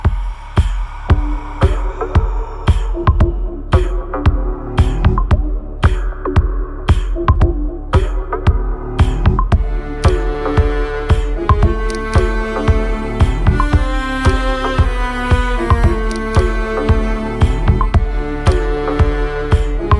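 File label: background music ringtone; DHOOMAM TITLE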